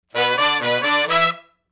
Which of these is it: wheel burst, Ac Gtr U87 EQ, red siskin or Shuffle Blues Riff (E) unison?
Shuffle Blues Riff (E) unison